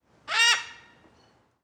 Grito de Arara Caninde

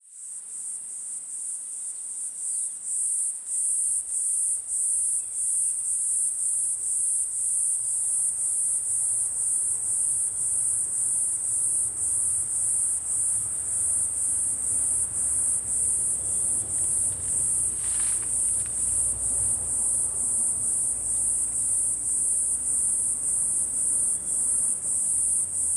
Grilo Agudo com Carro Passando Devagar ao Fundo; insetos

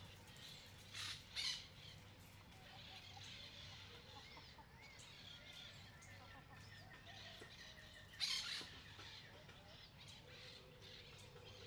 Arara e galinhas andando na grama em chacara com outros passaros, galo; aves; cachorro

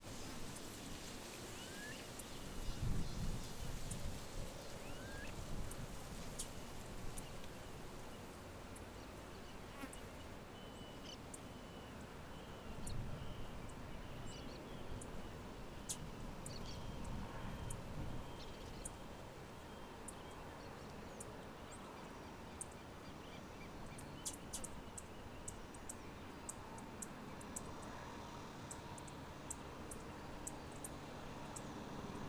Ambiente plantacao de cana perto de usina; ambiencia rural

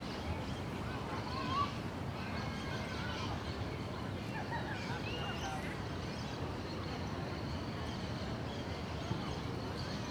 Ambiencia parque flamboyant a tarde pessoas, passaros e buzina de carro no; ambiencia urbana

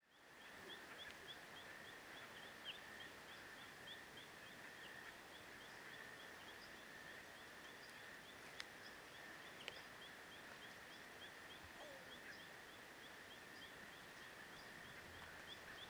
Ambiente no Jardim; de Maytrea; ambientes naturais; canais surround 5 1

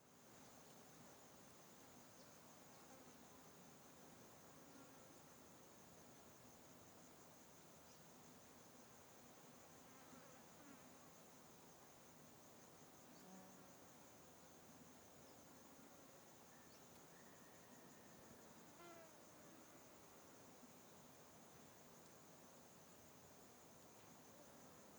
Ambiente area desmatada insetos grilos; ambiente externo

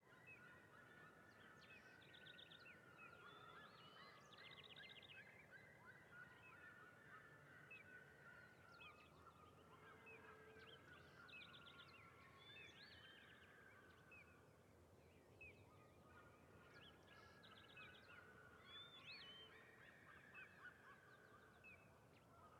Seriemas Cantando ao Longe no Vale; aves; do Sertão Zen

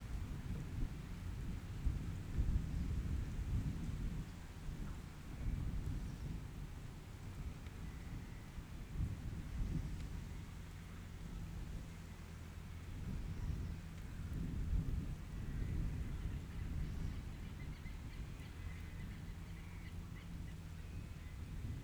Ventando nas arvores campinho de futebol com passaros, voz feminina longe; e cortador

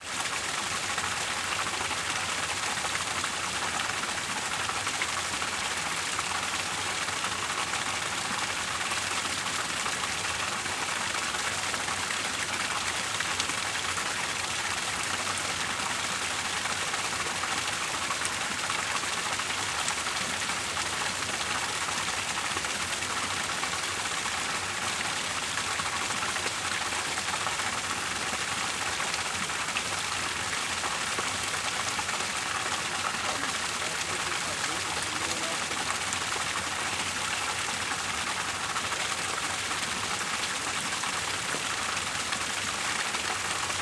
Pequena queda dagua bem aguda e fina

